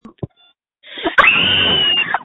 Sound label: Scream